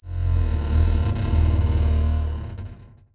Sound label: docked boat sfx